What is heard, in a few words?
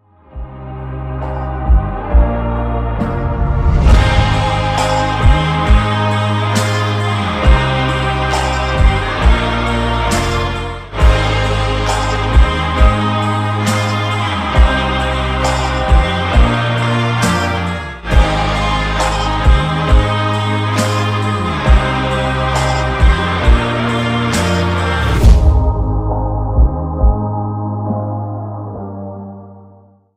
instrumental